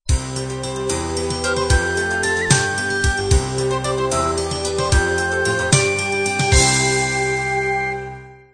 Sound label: celtic ballad end